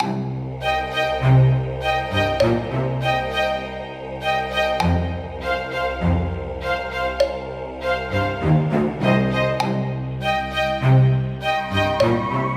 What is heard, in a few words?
Lobby Music Halloween Edition